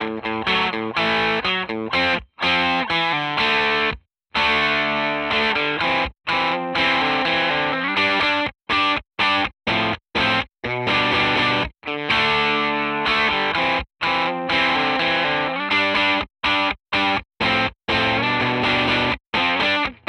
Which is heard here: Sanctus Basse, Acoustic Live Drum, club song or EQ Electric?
EQ Electric